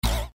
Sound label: cascade Land; Bouncy Balls 2